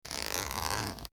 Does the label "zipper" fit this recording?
Yes